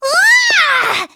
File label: waaah; monokuma kid